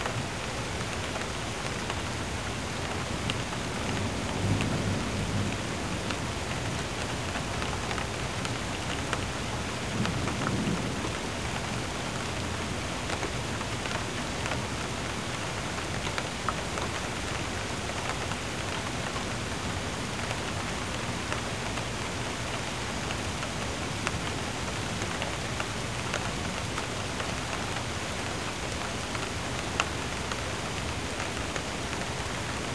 rain urban

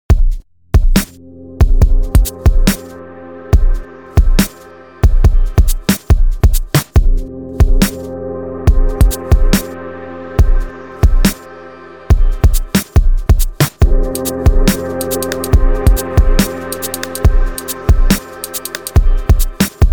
electronic music; keen

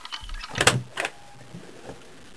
basement door unlock

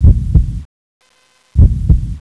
heartbeat

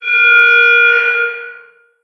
alarm; bismarck

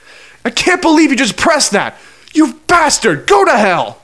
die; barney

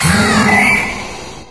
hydra pain; scmod

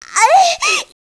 loop, weapons

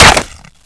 headshot

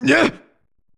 evil pain; buu

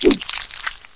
hornetgun

